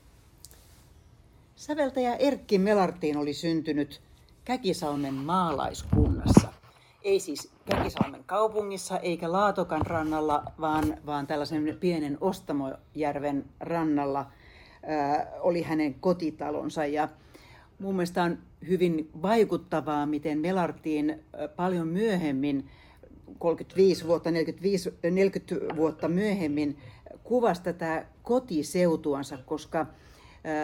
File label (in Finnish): kansanlaulut; Kakisalmi; erkki melartin kakisalmelainen